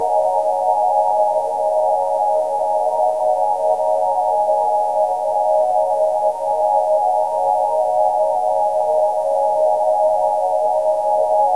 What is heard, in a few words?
calm luxurious spa ambien